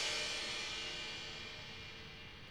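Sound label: Crash Cymbals; CMI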